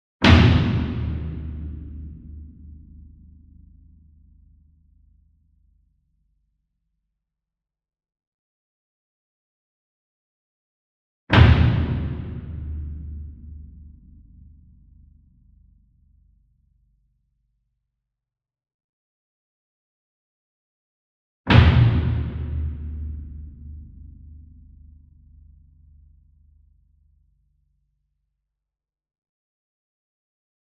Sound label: SINGLE DRUM Bass Drum Concert 40 Inch Flam Soft Beater Far; boom library; Cinematic Strikes Construction Kit